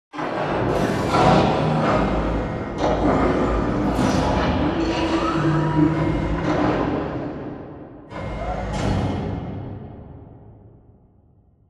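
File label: Assembly Wall Arm Distant; Titanfall 2; Boomtown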